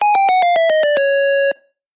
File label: game over